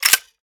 slide pull, usp; weapons; Benny Placeholders

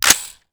chamber press, weapons, m16; Urban-Coalition; Decay; arccw ud

